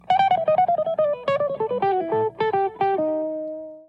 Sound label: Riff